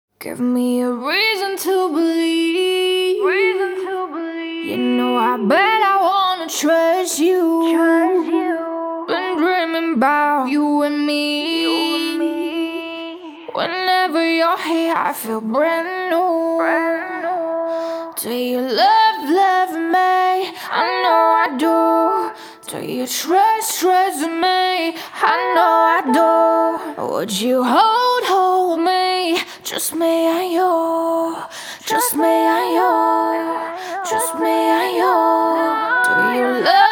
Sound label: WET ACAPELLA (108 BPM Eminor), Pop Vocals; JUST ME AND YOU; Acapellas